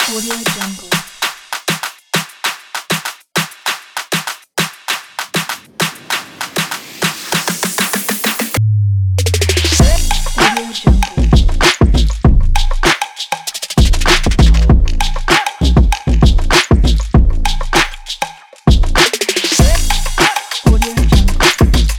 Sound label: the rap